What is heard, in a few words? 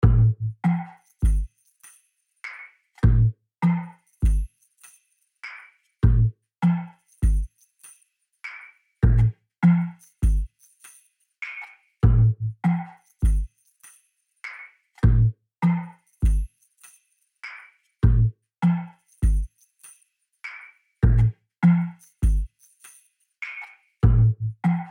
Five-Four Guitar Groove